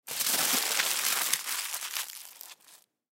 Aluminum Foil Sheet, Aluminum Foil Sheet Crumple Up; Metal; Foley; Materials; Blastwave